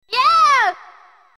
toad joy

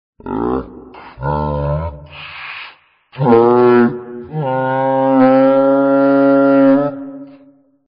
train can't stop; vapesquared

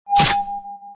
attack ping

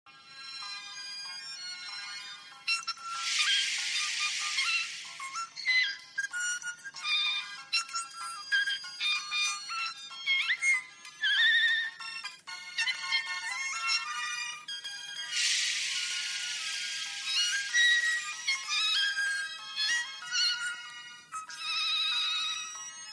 drowning

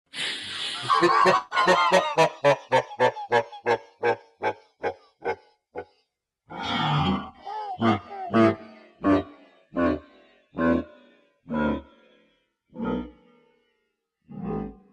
laugh

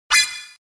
alert

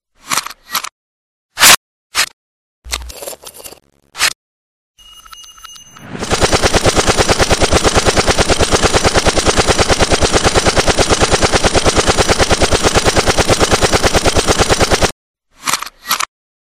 gun, reverse

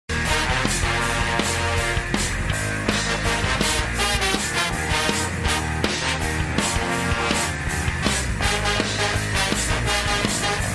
ska

